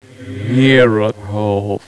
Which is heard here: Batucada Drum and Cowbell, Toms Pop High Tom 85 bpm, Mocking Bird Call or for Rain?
for Rain